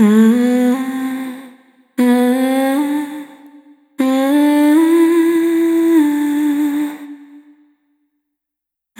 Single Voice Melodic Loops

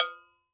GANKOKWE, African Percussion; E-MU Producer Series Vol. 7 – Old World Instruments

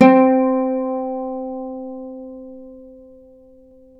Celtic Harp, Plucked Strings

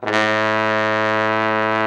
Trombone; Sound & Vision - Gigapack II CD 1

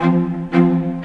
Strings; Sonic Foundry (Sony Creative Software); Orchestral Series VOL-4; Rock and Pop; Rock-Pop 02

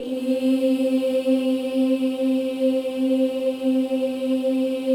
BOYS EES; Symphony of Voices

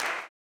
CLAP, Blues Soul; Spectrasonics Vocal Planet

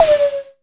TOM; TOMS; Back In Time Records; Big Bang

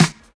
Percussion, House

